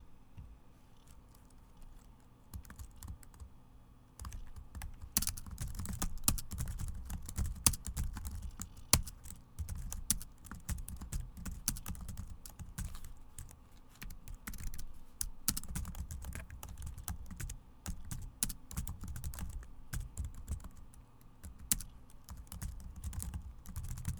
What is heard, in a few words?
keyboard